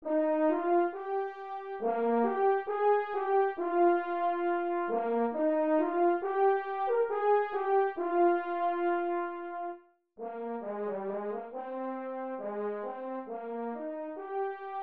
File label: horn